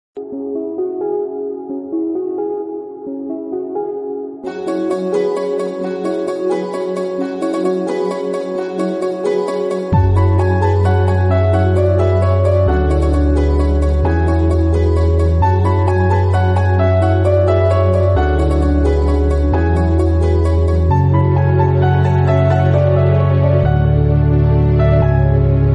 Bgm Instrumental

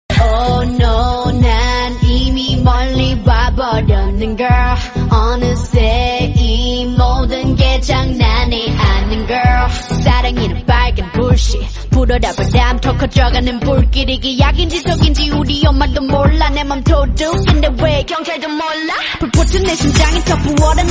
Korean Song

